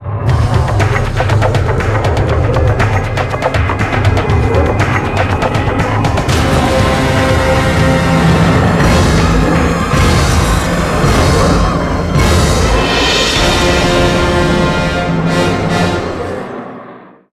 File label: Victory; the unholy war; ps1; Arcanes